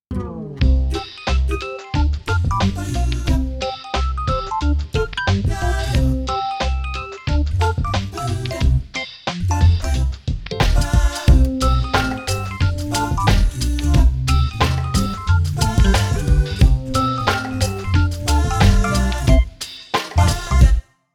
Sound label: Happy Day, KIDS 귀여운